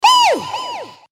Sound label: "oohwuh", voice